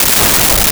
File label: skratch; Sound Effects Library (28Cds) plus bonuses